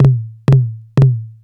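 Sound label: lo tom flam, saturated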